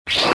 hologram on

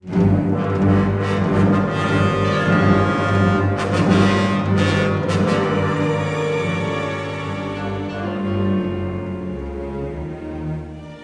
tension, music

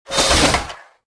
get up; Star Wars Jedi Knight II Jedi Outcast; XBOX

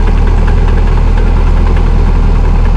parade float engine loop; auto; Tony Hawk's Project 8; XBOX

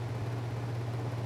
Shop Hum; Cars; XBOX; Ambient

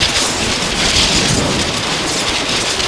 lightning, weapons force

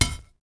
pipe hit dirt; impacts explos

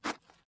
fs dirt, footsteps

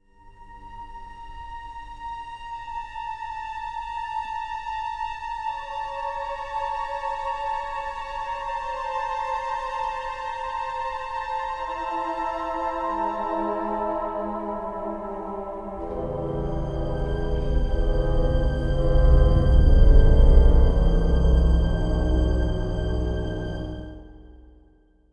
suspense, music

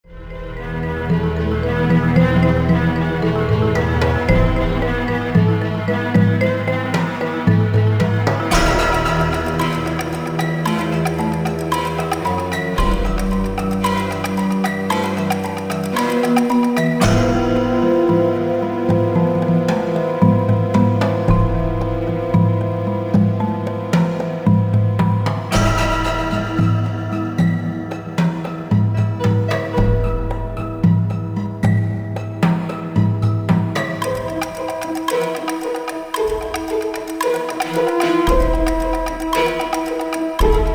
slow, music; Soldier Of Fortune II Double Helix; XBOX; colombia

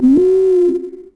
Call, Dino

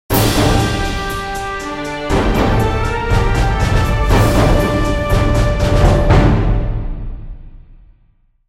Fight Win; music; Sulpice; The Da Vinci Code; XBOX

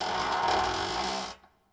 creak metal; Star Wars Obi Wan; XBOX

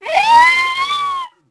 Gali Pain, Dino

